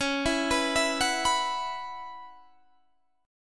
Gb 7th Suspended 4th Db, chord

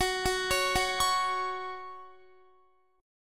F sharp 5th F sharp down Guitar; arpeggiated; 4F,4A,5C,5G,5C,6E; JGuitar

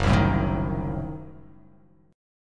F sharp Diminished 7th F sharp

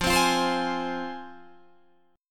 F Minor, Guitar Open D; 15,15,15,18,15,18

